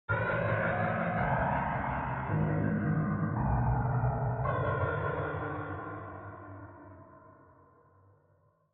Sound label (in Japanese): ピアノジングル; 48khz 24bit; 新OP原曲洋館風short